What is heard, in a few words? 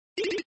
Menusound System Escape, flipper; Systemsounds